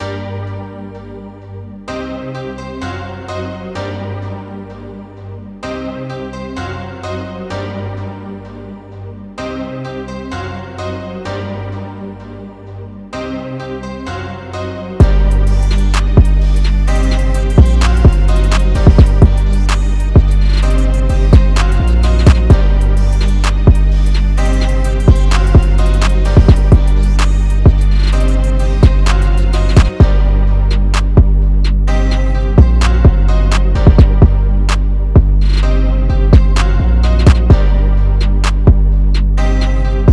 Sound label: Key C min 128 BPM Full Mix